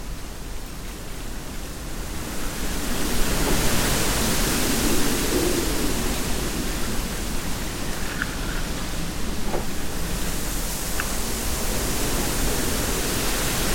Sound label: wind and trees; nature